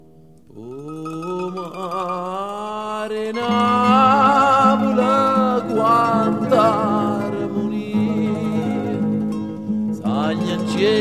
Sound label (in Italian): mandolinata; Mandolinata a Napule